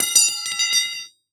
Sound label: Impact Metal Pipe Hit Cement